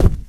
cardboard, footsteps, run; Left 4 Dead 2

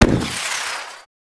Rocket Shoot; Sid Meier's Civilization 4 Complete; Warlords; Units

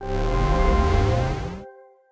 bonus; Alawar; Crusaders Of Space 2

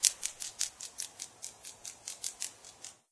hopper fly; random amb sounds

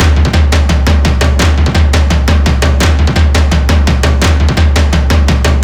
drums; Left 4 Dead 2; zombat; horde

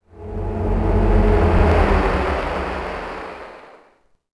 City Flyby Small; Ambient; Sid Meier's Civilization 4 Complete; Beyond the Sword

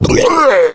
male boomer pain short; voice; Left 4 Dead 2

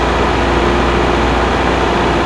train engine idle; ambient; Team Fortress 2

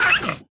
Bleeder Hurt Vox; Sid Meier's Civilization 4 Complete; Beyond the Sword; Units

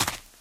dirt, footsteps survivor walk; Left 4 Dead 2

